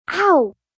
Pain Kid Ow, Pain, child, boy

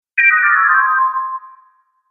Scifi Game Fail; Video Game Sound Effects

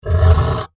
Monster Groan, Horror, Monster, Groan, Creature; Monsters (Sound Effects:Horror)

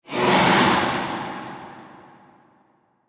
Hit Whoosh Breath; Production Element; BlastWave

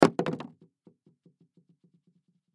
High Heel Shoes Drop; Foley; Objects; Blastwave

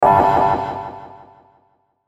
Hit Resonant Delay Airy; Blastwave

